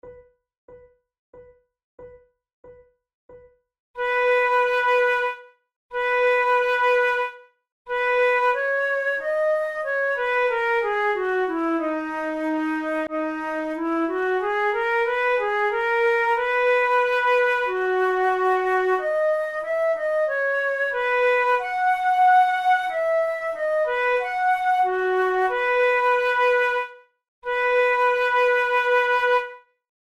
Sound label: prelude, flute